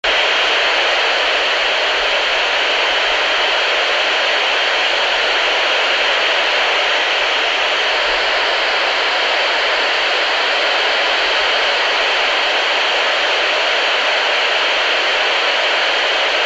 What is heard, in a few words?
Static; Walkie-talkie